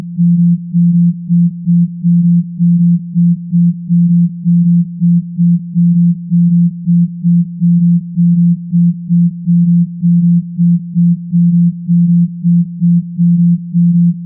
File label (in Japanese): PSK, ある映画音楽のリズム